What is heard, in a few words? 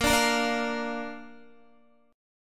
A sharp Major, Guitar; strummed; JGuitar